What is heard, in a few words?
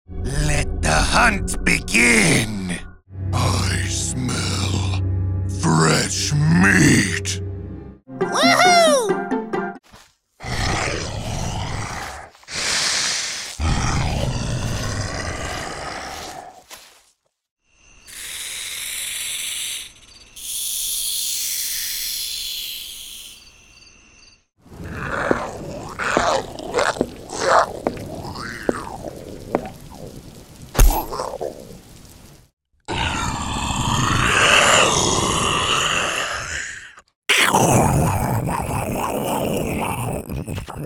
Creature